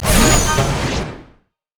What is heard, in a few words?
orb return; LoL; ahri skin04